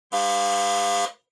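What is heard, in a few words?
Doorbell Mechanical Buzzer Close 50Hz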